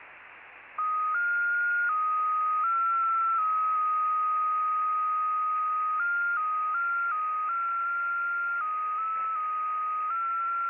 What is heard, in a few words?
JT65A, JT65A 65-TONE MFSK; (AMATEUR RADIO MODE)